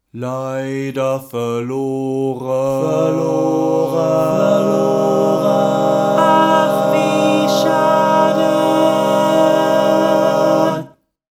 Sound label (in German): leider verloren chor